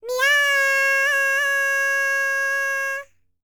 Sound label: PERSIAN, World Voices; ILIO; Vocal Planet VOL-5